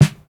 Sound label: snare, snare house